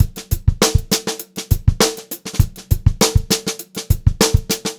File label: BEAT, Funk-Groove Drumloops